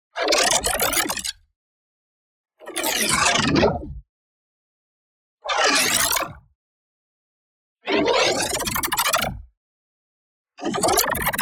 ABILITY CHARGE Phasy Start Up; boom library; Matter

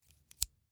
Scissors, snap, snip, shear, small med, single; Eiravaein Works; Vaeyan IV

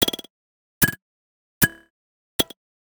Digital Click Positive

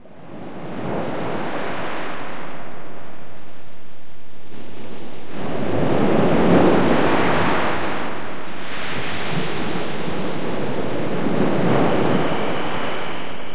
SEA; XXL 800 Production Effects

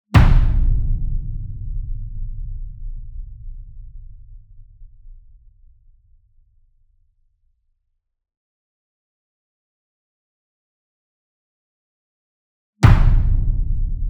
Single Drum Verdi 66 Inch Hit Hard Beater Near; boom library; Cinematic Strikes Construction Kit

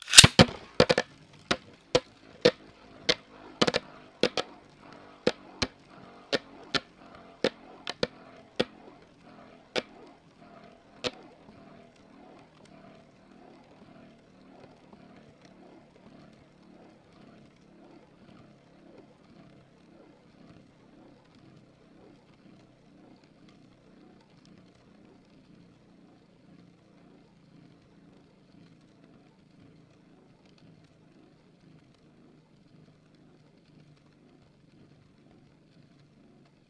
bayblade; devices accessories utilities; NS Studios - ultra sound collection

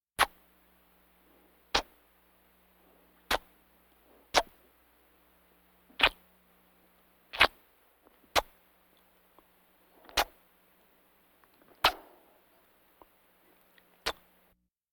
Vocal effects breathing Moaning Baby

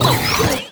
Menu Scifi; Menu FX

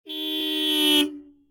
horn car; Blind Drive

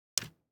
DICE on Felt, Grab, Standard, 2 Two Dice; Samples; ArticulatedSounds; DICE Sfx Party (Extended)

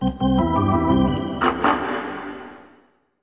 Hockey Organ

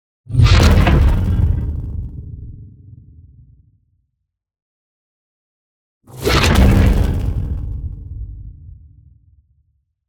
NEON HIT LARGE; Crack In The Sky; boom library

